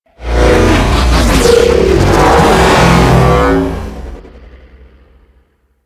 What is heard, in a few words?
Ship Attack Maneuver Generic, Engines; Warframe; Vehicles; RailJack; RailJack Enemy Ships; Corpus